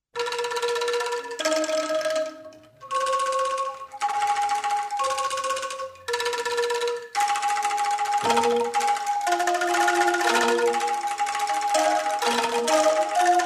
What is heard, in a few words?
anklung, Percussion